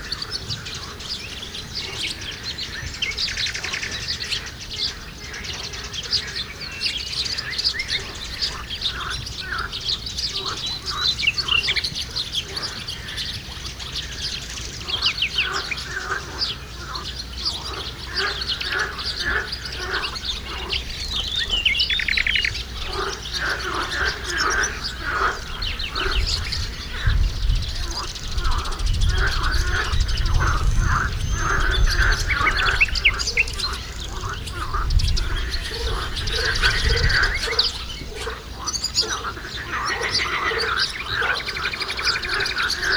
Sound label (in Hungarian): madarak és békák hortobágyi halastavak